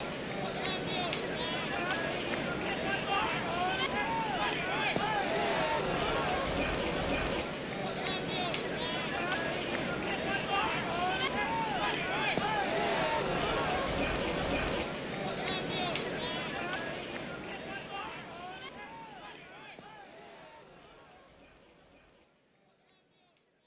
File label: Hitting Cheer; clutch hitter; arcade